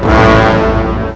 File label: HORN DOOM; BRASS2